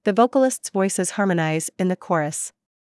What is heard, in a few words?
The vocalists voices harmonize in the chorus